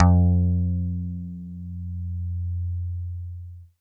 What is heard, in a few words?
E-GITARRE, Alpine Volksmusik; Best Service